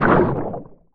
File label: Sludge Sling Cast